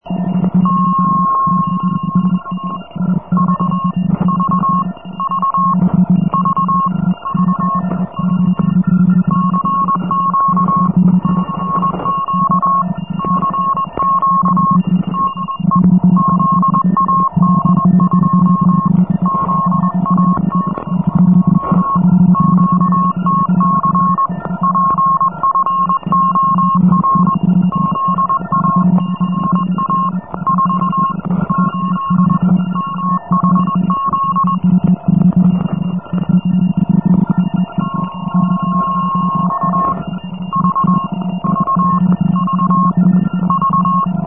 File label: cw; 1982